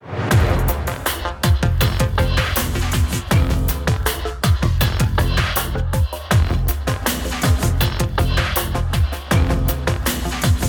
Aventure jingle; Jingles medley

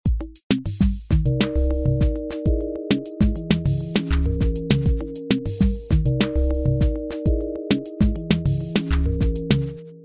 adagio, Jingles et loops

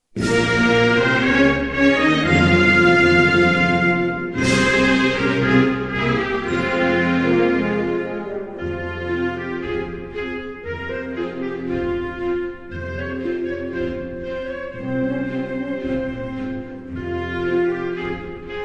Maroc, Hymnes nationaux

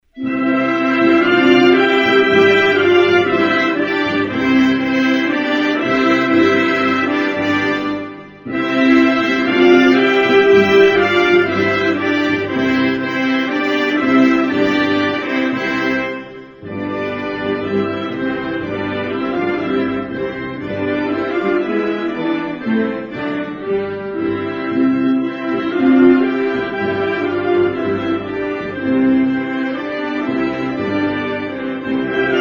Europe, Hymnes nationaux

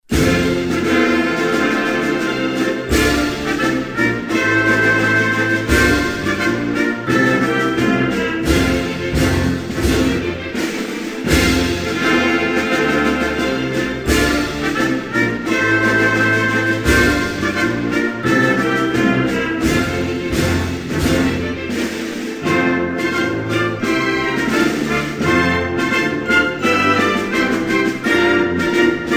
Arabie Saoudite, Hymnes nationaux

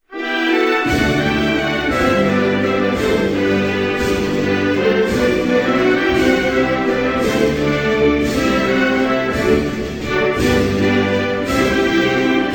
Bangladesh, Hymnes nationaux

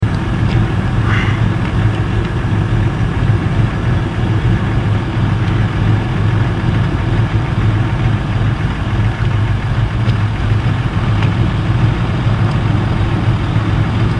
Blizzard, Vents

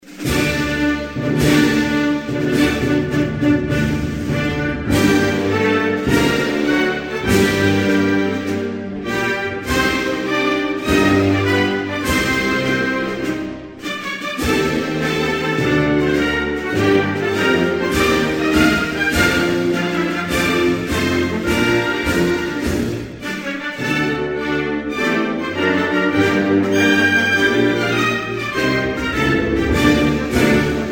Turquie, Hymnes nationaux

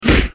Coup de poing

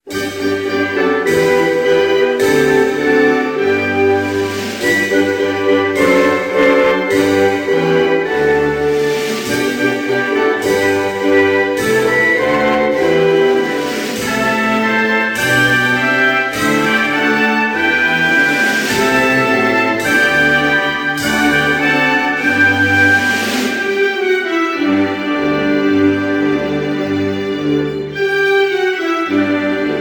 Zambie, Hymnes nationaux

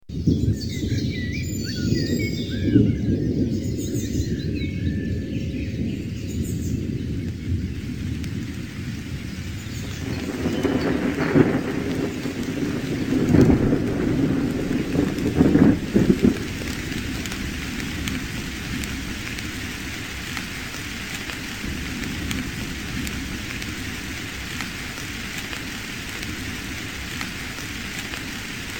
Orage commence en France; Orages

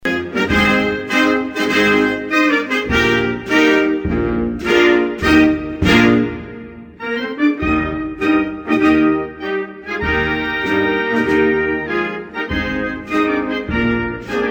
Republique Dominicaine, Hymnes nationaux

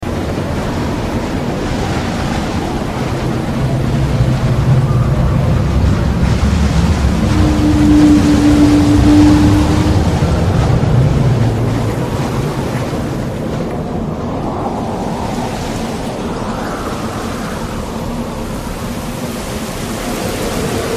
Hurlements sinistres, Vents

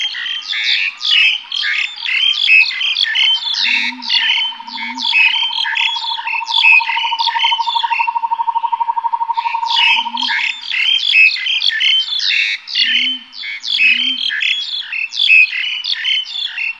Foret asiatique

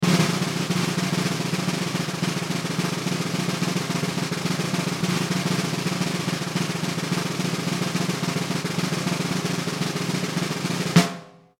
Roulement tambour; Cirque

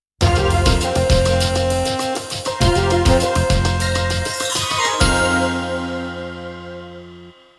Emission link, jingle; Jingles medley

